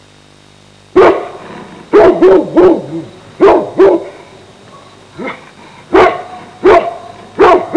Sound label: Large hound barking sound